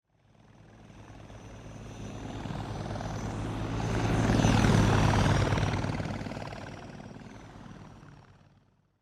Military helicopter flying overhead aircraft pass by